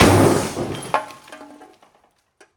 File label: peugeot 106 dropped 10m on metal plates; zaxcom; Sonniss GDC